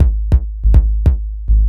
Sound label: Kicks, Club Techno, 142 BPM; Zero-G; Construction Kits